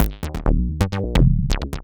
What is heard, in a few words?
erase magic trix 130; maudio; Elektron